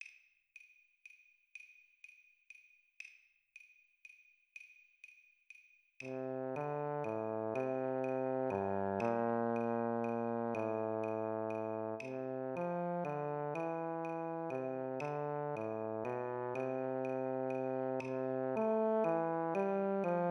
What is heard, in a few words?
Trombone Euphonium Play Along 40 BPM